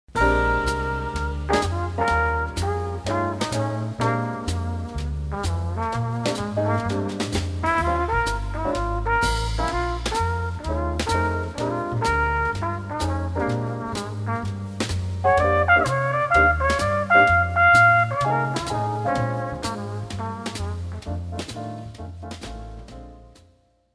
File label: Blues in Key of B flat concert exercice